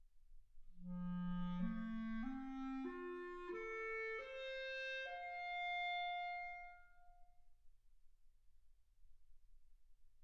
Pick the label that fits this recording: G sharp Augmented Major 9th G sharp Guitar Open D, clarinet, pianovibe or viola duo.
clarinet